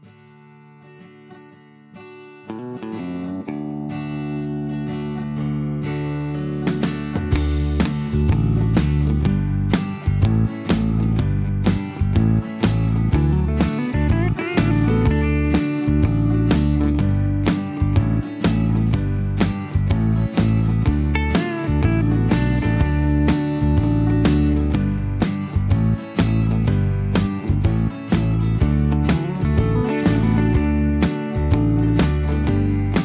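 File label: Instrumental without background vocals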